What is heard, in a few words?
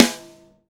TY Rim, Roland BK-5, Snares